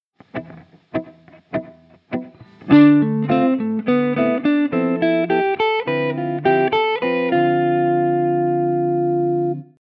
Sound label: dorian, guitar